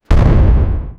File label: create a short explosion